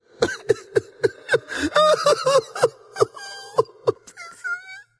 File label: boo hoo; smite; olorun